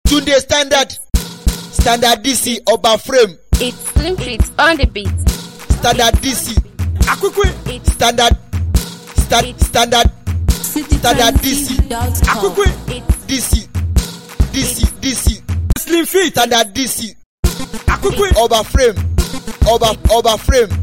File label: Beat